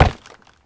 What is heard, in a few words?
Step Wood, Movement; Uriel; Warframe